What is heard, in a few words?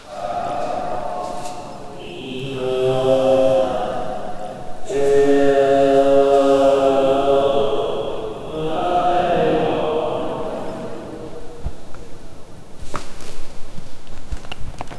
tibet monks inside large; culture